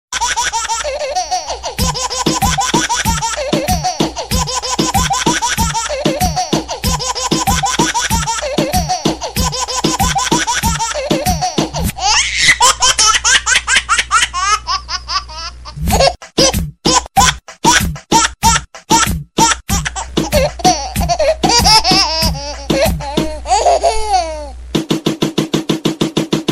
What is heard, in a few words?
Baby Rap